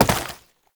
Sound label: Step Flesh, Foley; Warframe; Oraxia